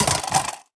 phys mag drop large drum concrete, magazine drops

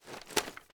inspect; gmod; viper

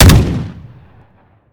weap fire; weapons; gmod

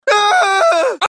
scream, male; gmod; npc

